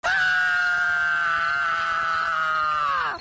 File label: courage yell